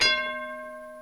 musical

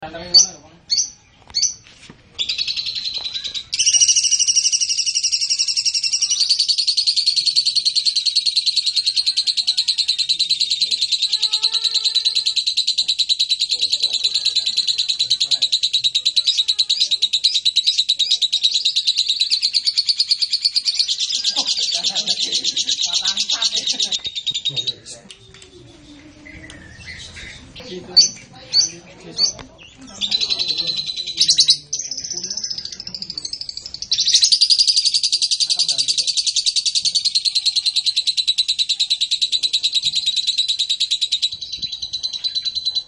audio lovebird blue

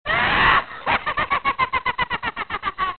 laugh

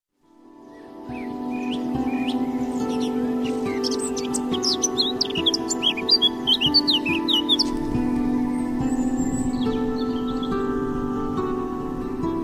healing music for birds

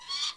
birds

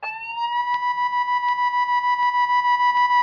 emergency

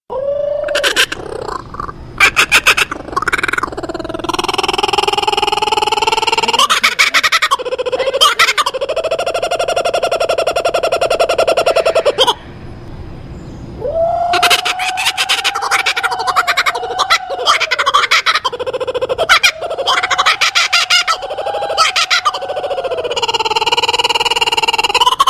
kookabura

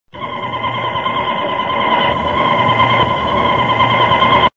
shokkel waterslager malinois

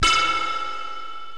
scifi

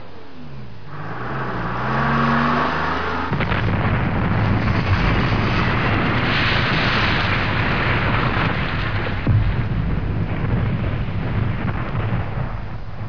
trucks

